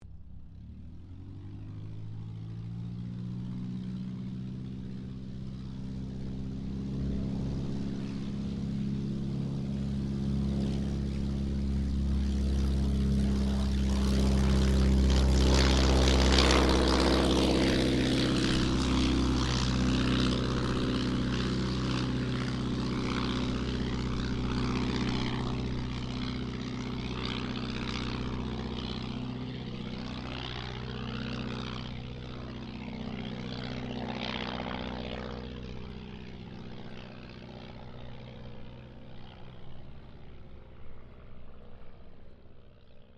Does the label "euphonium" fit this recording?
No